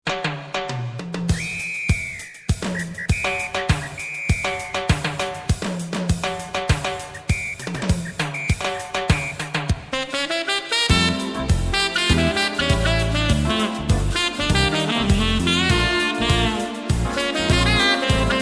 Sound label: Latin dance